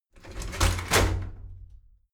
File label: shower slide door inside open heavy